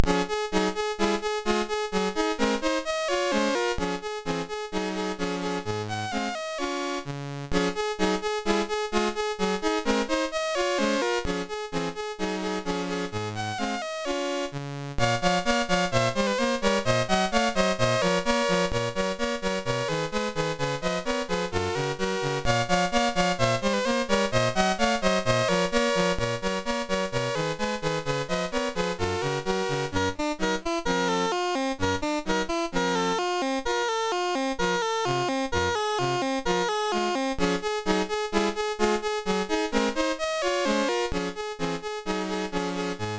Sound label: galop, Commodore SID Music